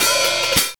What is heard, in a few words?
HAT SHRT INT, VINTAGE FUNK; Zero-G; Funky Elements